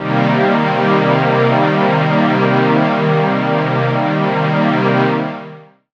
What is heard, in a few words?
Lo-Fi, Pads; Zero-G Jungle Warfare 1, 2 & 3; 90 s Sample CDs